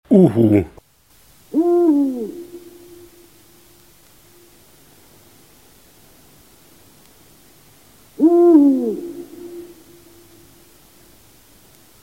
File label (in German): uhu; Gesänge bzw. Stimmen